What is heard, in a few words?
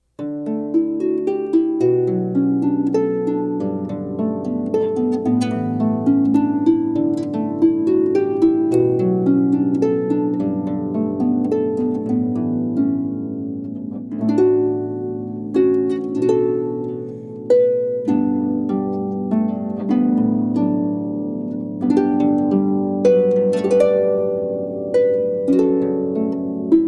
harp; harp-samples